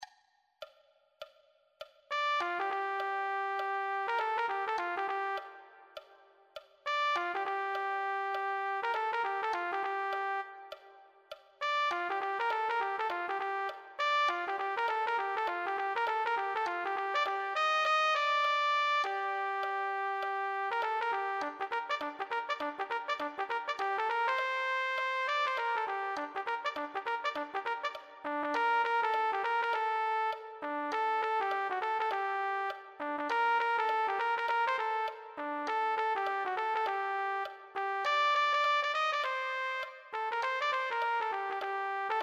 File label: trumpet